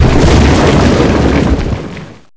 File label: collapse rubble